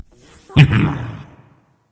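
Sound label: "heh heh", nonverbal; smite; voicepack; khepri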